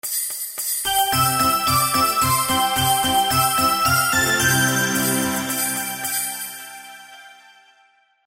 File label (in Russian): melodiya na novij god